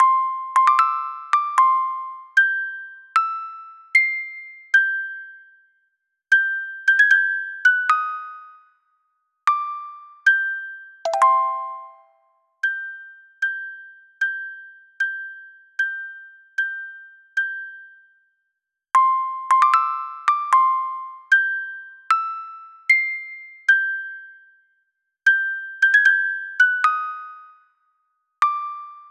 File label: Song (Music Box)